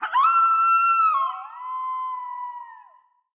howl, wolf; the wolfs night

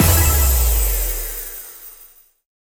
big Win Start, slots; king of slots

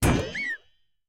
quick Stop Bonus Game; fairyred

